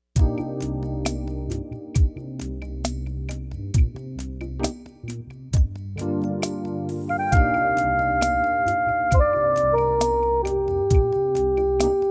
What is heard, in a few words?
Smooth Jazz Sax